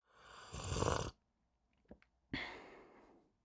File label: Female Woman Sipping a Drink Loud; Female Essentials